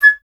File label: FLT FLTG#, Flute; Miroslav Vitous - Solo Instruments VOL-2